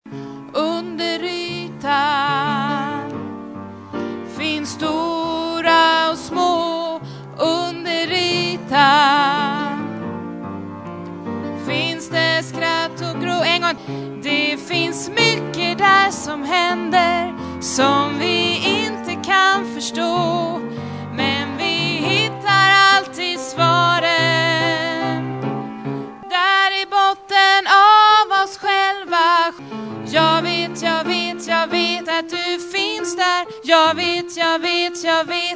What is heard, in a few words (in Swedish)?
sopran, stämmor